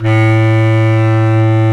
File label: Bs Clarinet, Orchestral Winds; Roland LCDP04 Orchestral Winds; Lo Clarinets